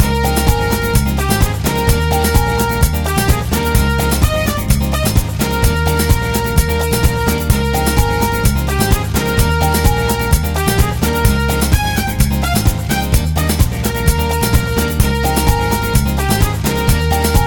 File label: samba